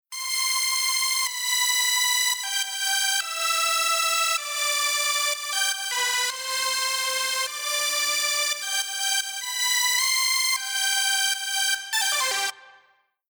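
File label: Future Bass Melody Lead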